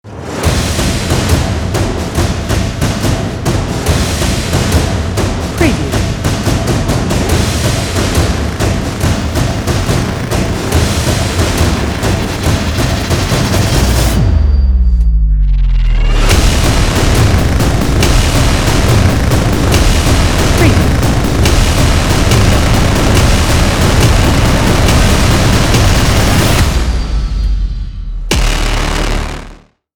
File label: Cinematic Epic Action Drums Battle War Powerful